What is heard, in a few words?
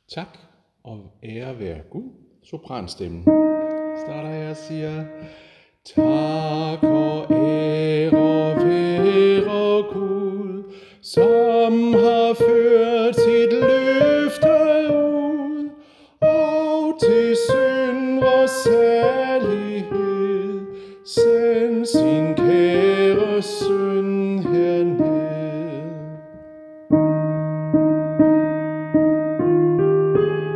sopran; harlev koret